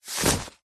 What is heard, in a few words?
clothes jacket thick high vis set down; zapsplat